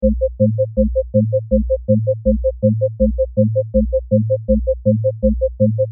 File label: rhythm